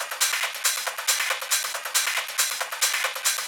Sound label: Loop 138BPM, Drums & Perc; Vengeance Samples; Freefall Kit; E minor